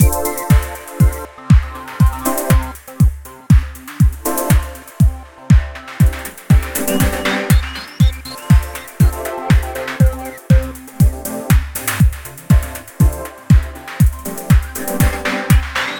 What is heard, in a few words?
trance test